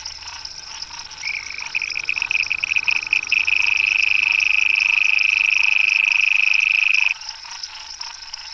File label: Rhinella pygmaea